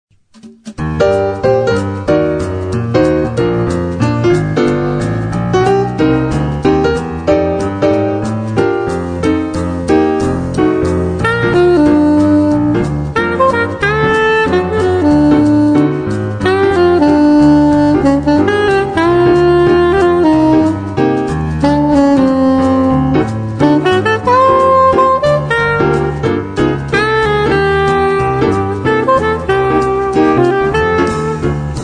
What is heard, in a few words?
sax